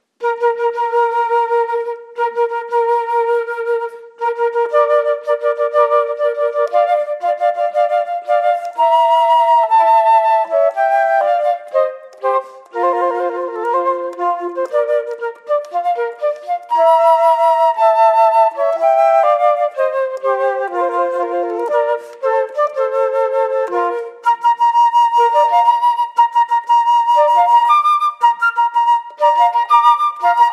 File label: Wedding March, Flute Duets